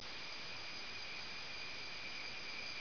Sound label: bells